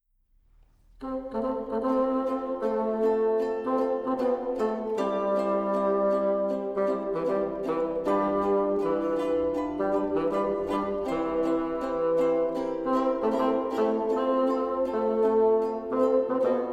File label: Ukulele